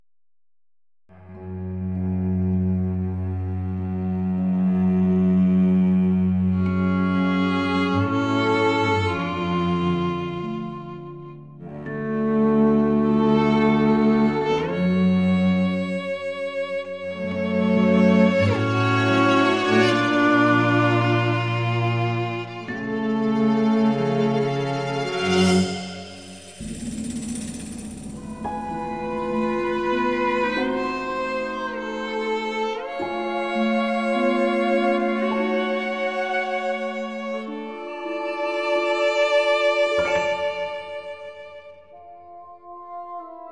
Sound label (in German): kuckuck nur